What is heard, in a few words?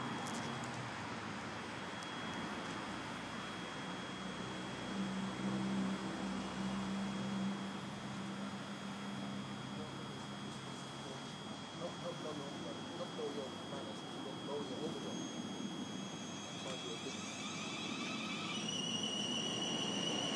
south west trains sound like whistling; south west trains sound like gandalf whistling for shadowfax